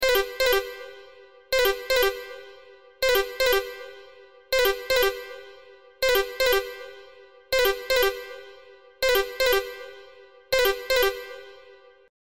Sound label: Alien Phone; Sony Ericsson; ringtones